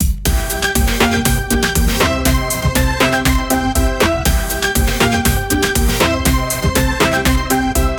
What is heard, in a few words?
make an exciting dance ga, hip hop